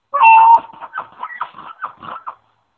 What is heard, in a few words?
loud chuckle